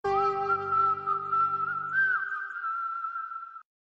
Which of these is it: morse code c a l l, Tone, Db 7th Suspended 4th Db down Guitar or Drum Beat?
morse code c a l l